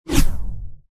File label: defense success; celebration Events